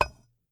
lock

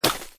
breakup

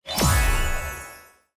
ui level up

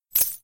frozen

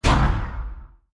ban ball

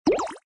ball swap fail

